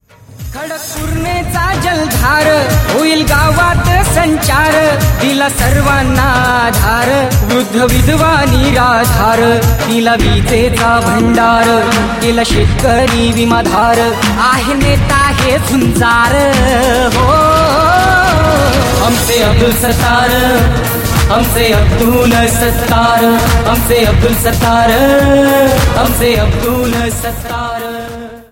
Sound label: Song ringtone